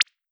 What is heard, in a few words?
cute pop